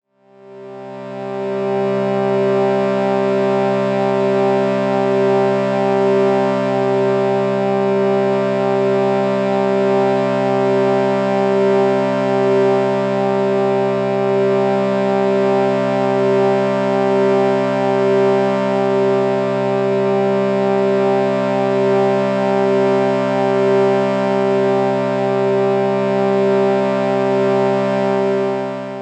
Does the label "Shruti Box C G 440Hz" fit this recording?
Yes